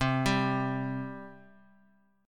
C 5th, C, down; arpeggiated; chord; JGuitar

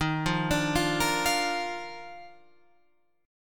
D sharp Major 7th Suspended 2nd, Guitar, Open F; arpeggiated; JGuitar

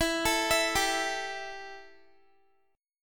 E Minor Double Flat 5th E Guitar; Embb5; arpeggiated

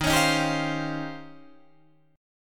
Eb 13th, Guitar, Open D

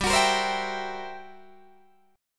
G Minor Major 13th, G, Guitar, DADGAD; GmM13; strummed; 17,21,20,17,19,17

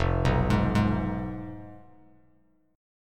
F Diminished F down Bass Standard; Fdim arpeggiated; JGuitar

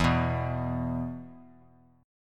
E 5th E down; strummed; JGuitar

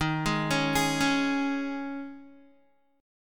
D sharp 7th Suspended 4th, Guitar; D#7sus4; arpeggiated; 11,11,11,13,13,x; 4E,4A,5D,5G,5C,6F; chord shape

